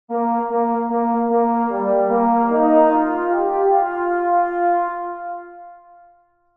The Gallant Trumpet

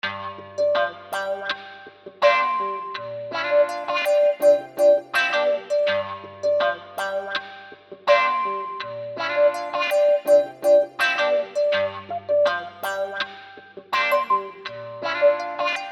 Funky Wah Groove; Pitiri

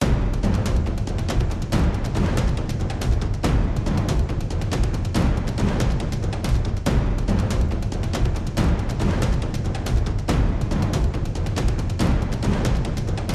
Chase Loop

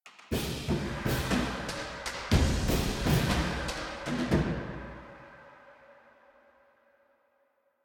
String; Assassin's Creed Unity Dead Kings

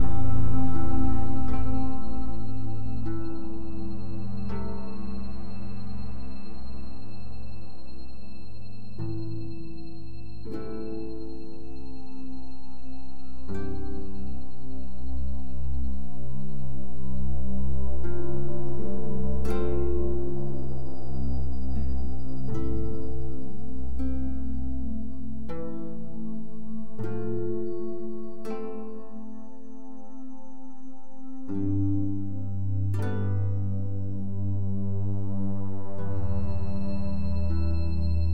Loop Neutral; the walking dead michonne

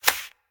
Ticket Appears; angry birds

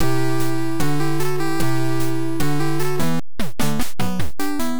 Bonus Game Won

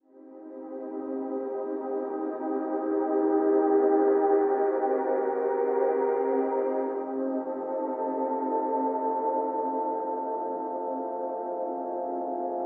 Pod Loop; Insanely Twisted Shadow Planet

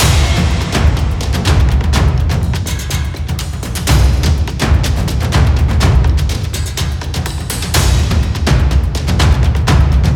Battle Start Loop

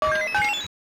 Coin; Nebulasray; Namco NB-1; arcade

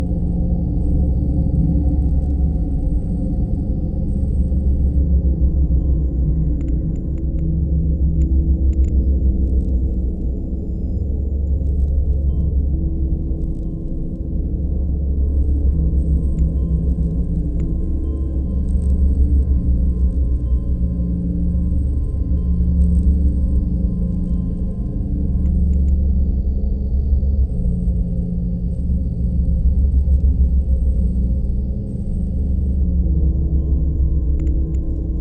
Ambience Encounter; Five Nights at Freddys; FNAF Special Delivery; android ios

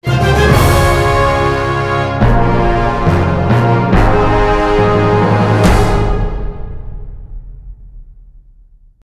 Boss victory; billy blade and the temple of time windows gamerip 2005

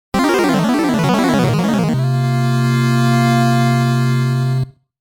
Game Start-Game Over; Game Sound Museum Namcot; Youkai Douchuuki

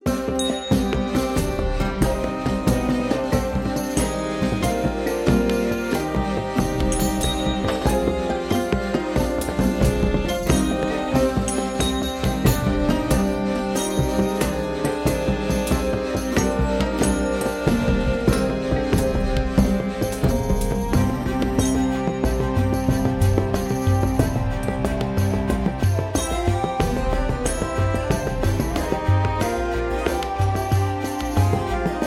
Loop Carefree; Game of Thrones: A Telltale Games Series

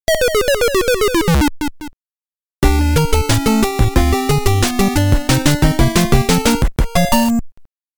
Game Over BGM; konami famicom chronicle vol.3 rom cassette edition

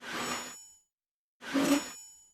sound Mace

